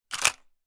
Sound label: gun nova; still north media